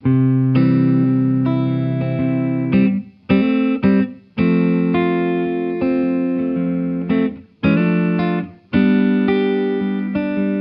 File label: solo